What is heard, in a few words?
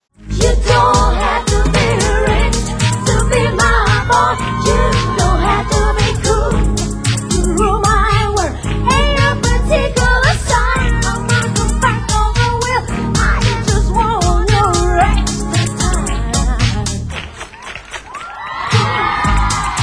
kiss